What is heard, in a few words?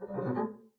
arco noise jumpy short, double bass; dsmolken; noises